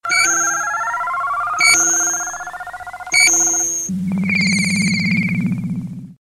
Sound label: Radar